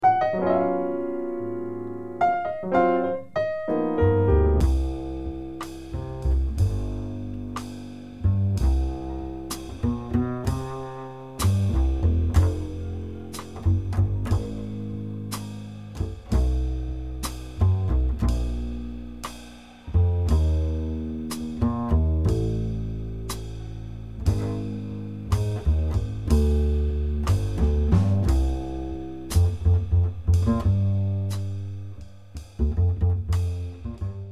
minus Piano

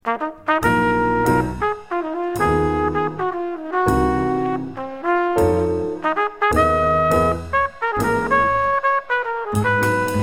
Horn Salute

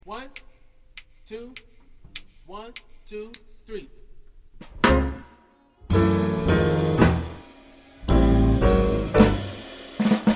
minus melody

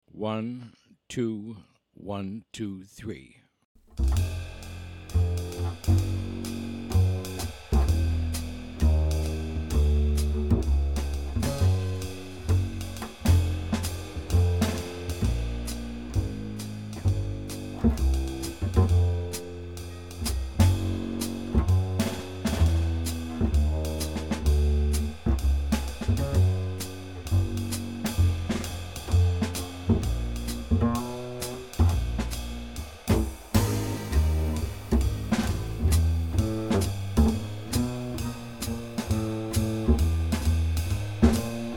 minus Piano

